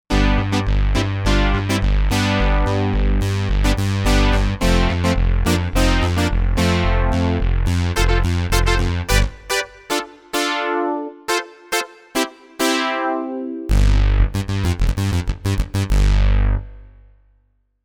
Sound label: PW Comp